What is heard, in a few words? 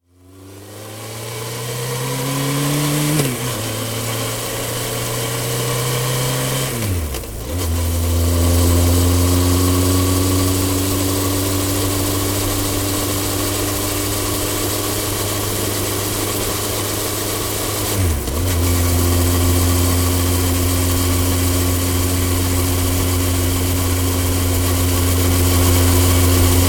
Motorbike Honda 1100 On Board Travel; Various speeds then slow to stop; Motorcycle; Tom Hutchings